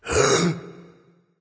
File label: nv breath, vox; smite; Vulcan